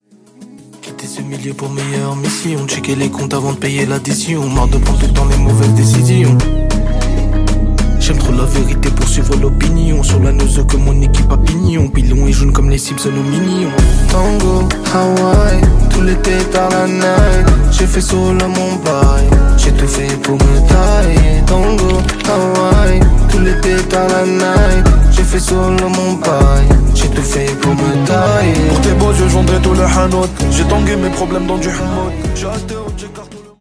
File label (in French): tango hawai